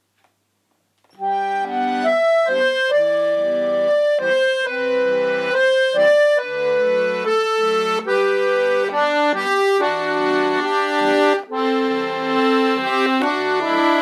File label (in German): Akkordeon